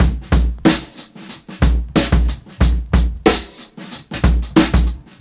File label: 92 bpm breakbeat sample b key, Free drum loop - kick tuned to the B note. Loudest frequency: 1116Hz